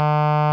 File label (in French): KVB bip; TGV Sud -est; OpenBVE; Train; Carmillon